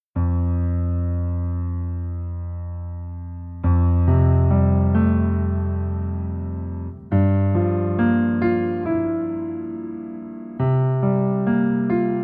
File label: Piano